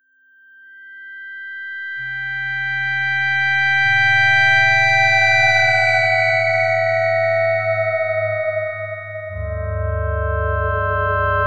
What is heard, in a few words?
FM Bender, Melodic; Sonic Foundry (Sony Creative Software); Slow Silhouette